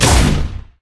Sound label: basic attack; lucian base